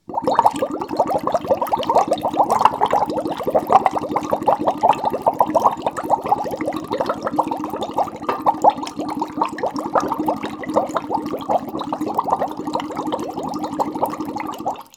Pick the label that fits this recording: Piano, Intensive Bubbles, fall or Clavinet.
Intensive Bubbles